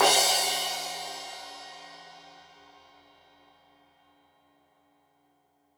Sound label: Crash 15in L edge, Cymbals Stereo